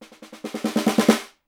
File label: SN, Percussion